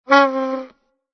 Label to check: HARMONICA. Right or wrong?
Right